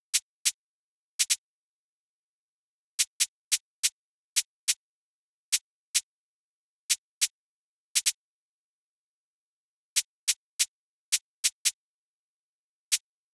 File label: Drum Loop - 142 BPM Counter Snare, Drill; Loop Stems; Cymatics; PARADOX II - Drum Loop Collection; Yearbook